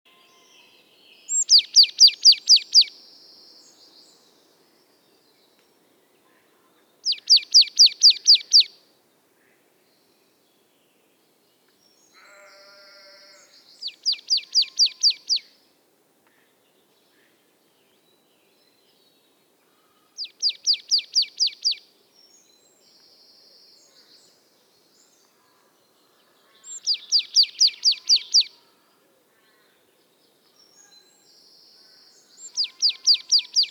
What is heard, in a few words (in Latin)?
Poecile palustris; SETE